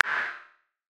Perc, Gated Reverb; creative gate; musicradar

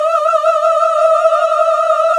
GOSP. AAAHH

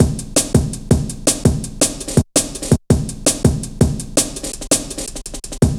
Ruffneck, Drum Loops 165.5; Zero-G Jungle Warfare 1, 2 & 3

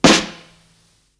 snare roll, Psychedelic Drums

